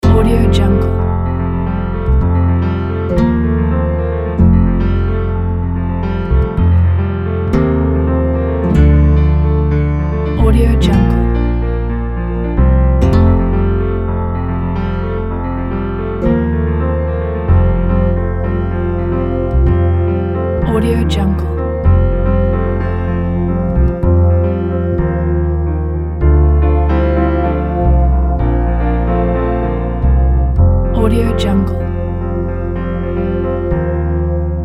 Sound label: holiday season; musiccampare